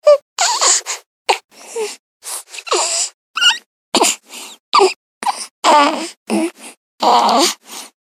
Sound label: baby breath noises